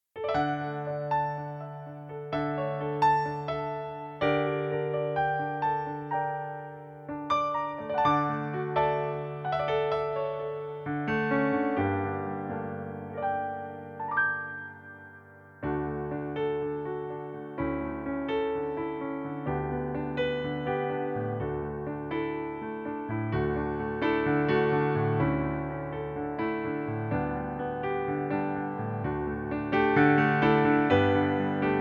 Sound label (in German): Pianoplayback in D-Dur